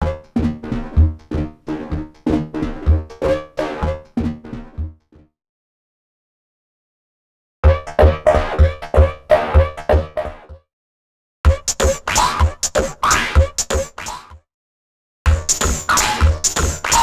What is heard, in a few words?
Electro 126bpm; X-Static Goldmine 5